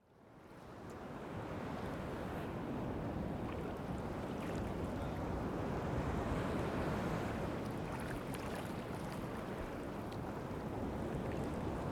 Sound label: ocean